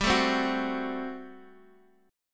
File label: G Major 7th Suspended 2nd Suspended 4th G down Guitar DADGAD